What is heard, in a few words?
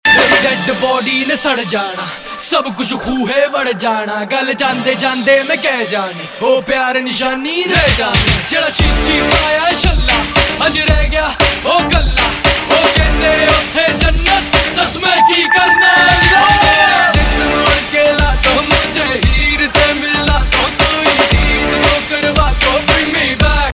RAP Ringtone, Punjabi Pop